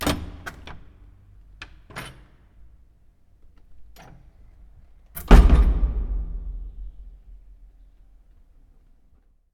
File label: Door Open Close